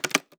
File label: Mechanical Keyboard Click